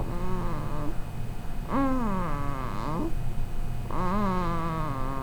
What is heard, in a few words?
snore; Snore-y Girl; wrapped in the sling